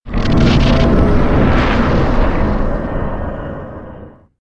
notification sound; dark souls; fog

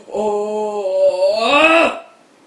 oooooooooh

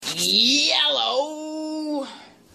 yellaoowwww

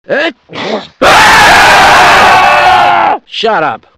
screams all in the same time; scientist

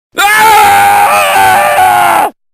haaaaughaaaughaugh; scientist

